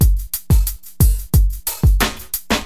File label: DM LOOP, HipHop, Drums; LiveAct Pool